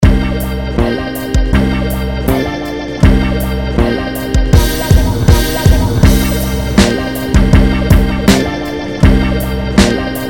E-Organ instrumental

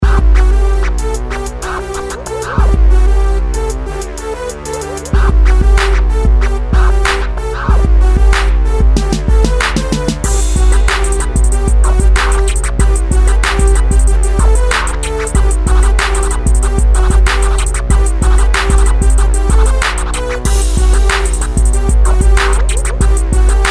Dirty South

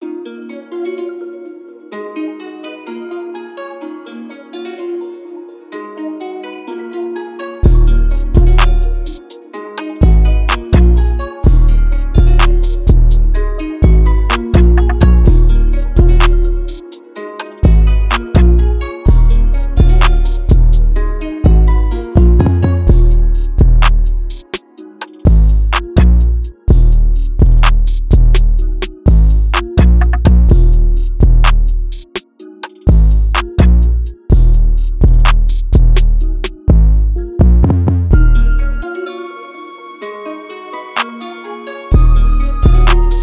Bass Car

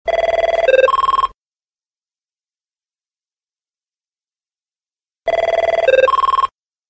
AVAYA Ring; Ring 8

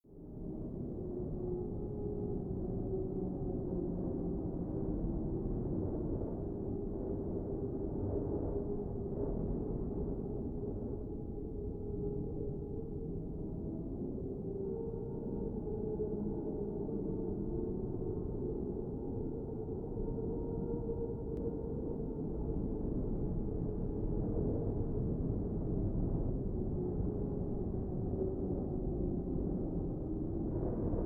Dark Hallway

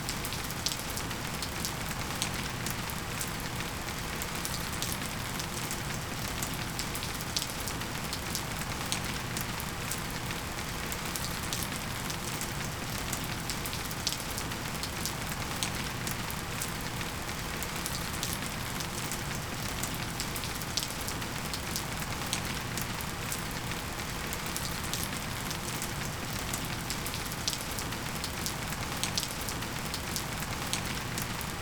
Rain Sounds Quiet Rain

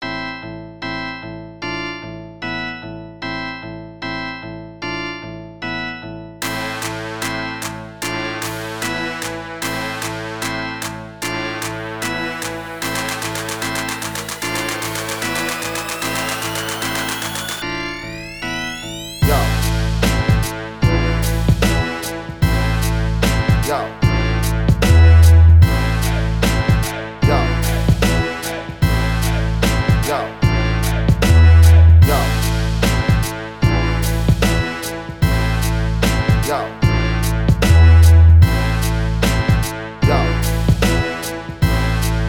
brass minus

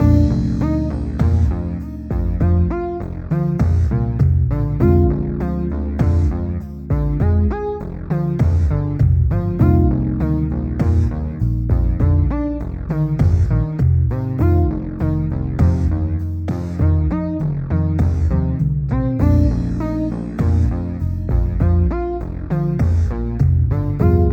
hop

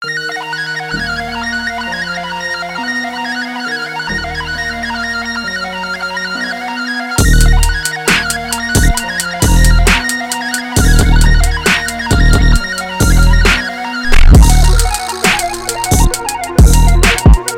GLOCK beat 134 BPM

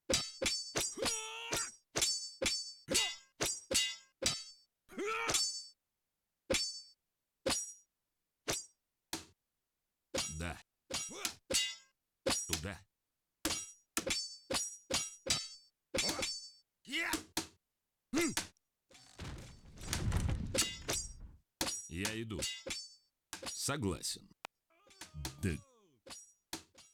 attack; Battle Realms

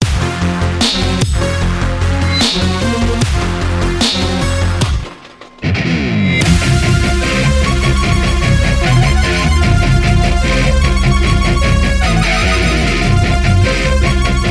Guitar Cover

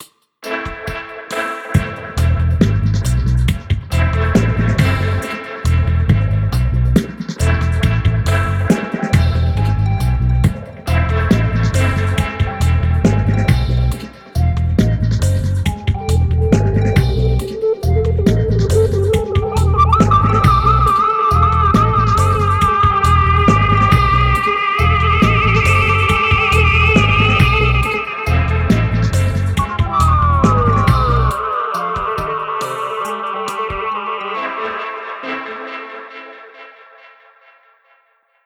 Dubstep

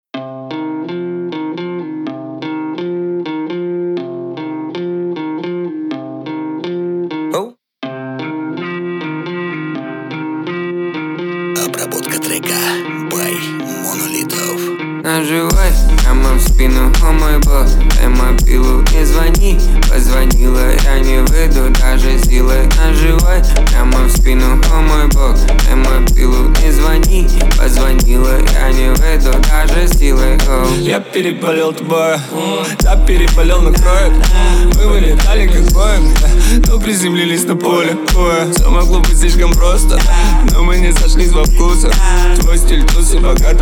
35-39Hz Low Bass

